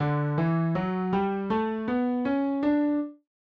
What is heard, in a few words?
doremi